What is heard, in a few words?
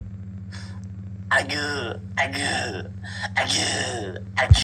aguuu